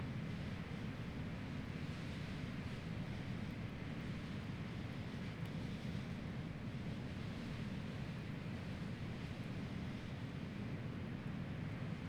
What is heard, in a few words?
Wind